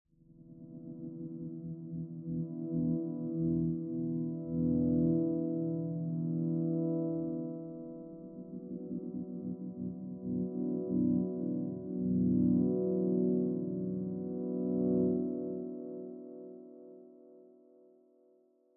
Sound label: Delay